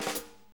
Shuffle Fill, Drum Tools

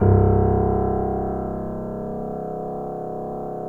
PNO PP; Synclavier Sampler Library

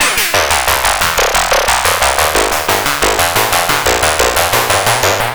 Serge, Synths Arpeggios; Electronic Point Blank

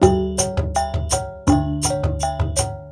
WYANG L, Heart of Asia

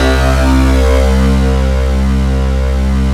PIANO BASS, SWEEP

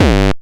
Bd X Gabba, Kick Drums; Zero-G; Soundclash

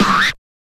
scratch short, Vinyl scratches

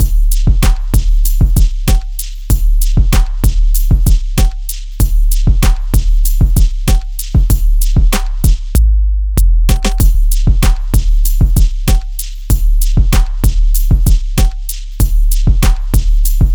Beat, club; Sonic Foundry (Sony Creative Software); Club Joints and Street Anthems; Toxic Rain

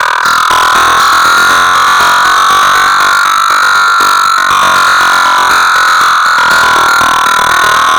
Structured Rhythm, IDM Rhythms

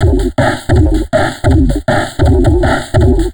160, Drum Loops Crazy Processed

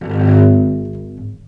VIOLE MUT G#0; Historical Instruments; USB Soundscan vol.23; AKAI

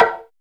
MODAL PERC; TEK PERC2

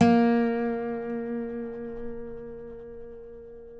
DOBRO NOTES; Country

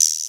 R8 DANCE KIT

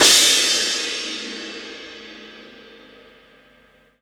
CYMBAL, CRASH; Sonic Foundry (Sony Creative Software); Loops & Samples Drum Tools; Laid Back

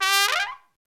SOLO TRUMPET

